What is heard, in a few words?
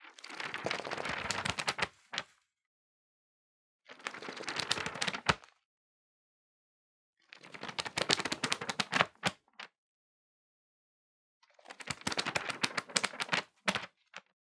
Organic Paper Brush Pages; boom library; The Interface